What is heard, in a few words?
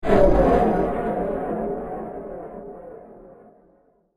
Whoosh Fast; Whoosh Sound Effects